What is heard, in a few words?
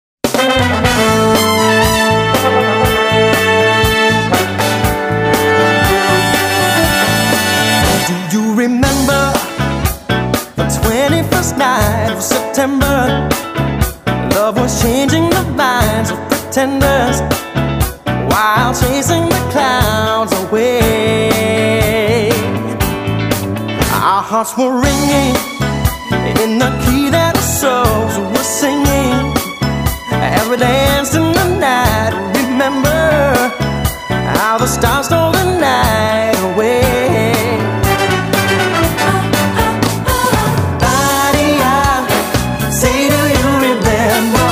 SEPTEMBER